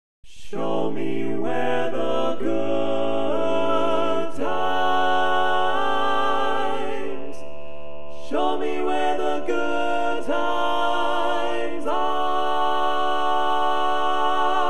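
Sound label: barbershop tags, baritone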